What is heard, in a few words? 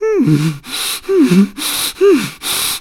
Breathe; Yuga breathing heavily; Zelda